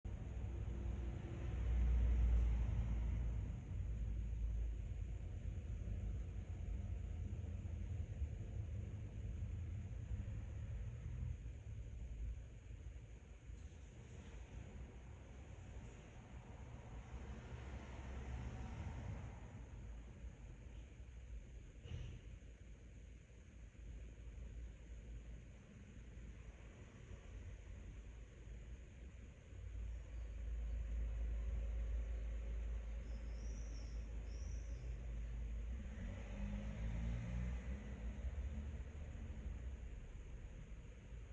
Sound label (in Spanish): CORRAL DE COMEDIAS